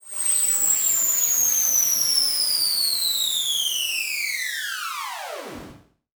Science Fiction Sci-Fi Electronic Alarm Descending